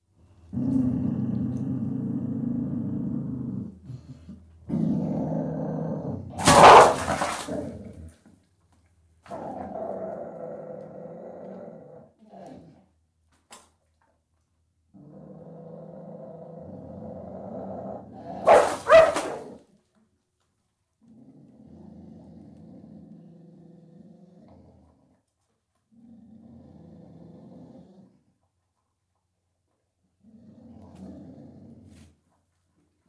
Small dog, interior, threatening to attack & attacking; Dogs; BBC